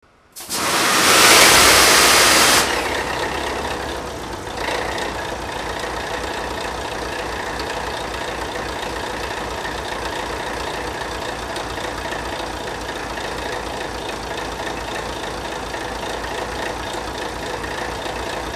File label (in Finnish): Dv16 soundit start; Junat